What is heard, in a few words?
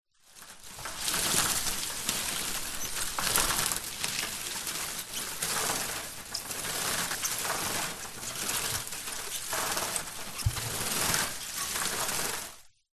tree branch leaves rub rustle against building or roof in wind